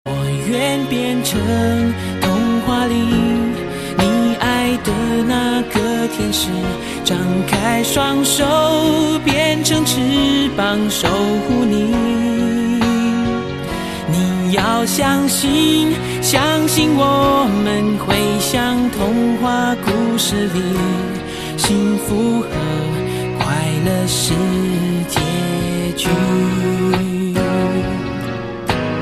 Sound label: Chinese sad song